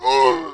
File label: die; MEDJAY